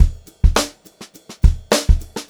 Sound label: Funk Groove Drumloops; USB Soundscan vol.38; AKAI